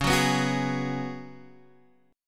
F sharp Suspended 2nd Suspended 4th

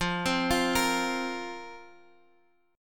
F Suspended 4th F

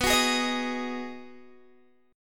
B 6th B down Guitar Open G

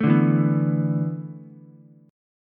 A Suspended 2nd Suspended 4th, down, Ukulele; Asus2sus4 strummed; Asus2sus4 chord; JGuitar

